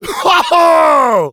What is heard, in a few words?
laugh short, fr; Rire court; Demoman